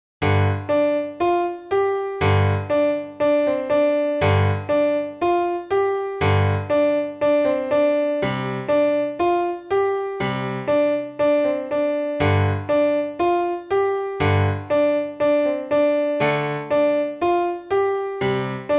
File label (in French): Piano seul